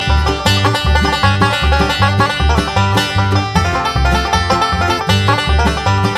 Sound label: bluegrass breakdown